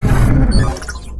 Enemy Door Down

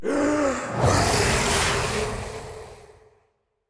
Wind Zephyr; Wind Zephyr All4

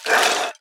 Skeleton Prepare

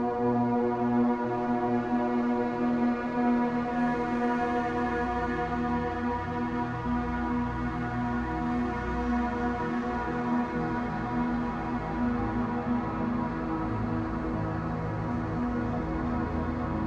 Amb Orchestra-Tuning Loop; Physicist

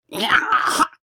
Attack Yelp

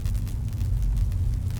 spin attack electricity; unsighted

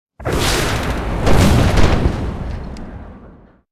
Hellfire Missile

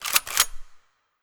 Aerobot Shot Reload

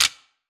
Skel Foot Step

